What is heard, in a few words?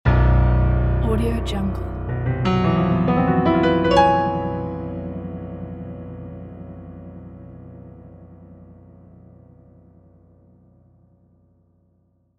dark piano melody